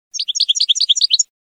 floreo tovi tovi, campero

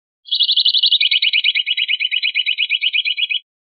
rep acanariado, pardillo

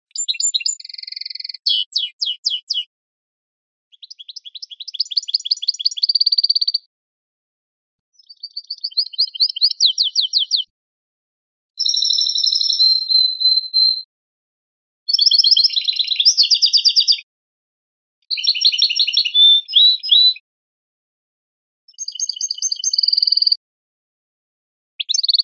notas canario; campero; penalizaciones

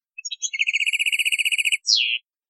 gorrion; campero

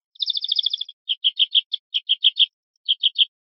gorrionada, verderon

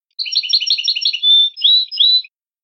canario; campero

